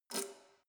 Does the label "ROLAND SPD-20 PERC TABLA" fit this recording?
No